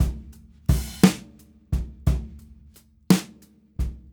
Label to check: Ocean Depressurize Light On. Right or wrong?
Wrong